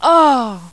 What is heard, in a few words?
OOOH; HUMAN